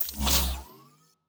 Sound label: Door, Sci-Fi Sounds